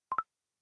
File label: Snap